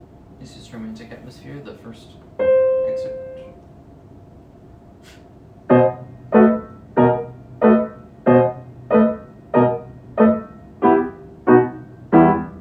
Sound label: Romantic Atmosphere